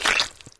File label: foot on mud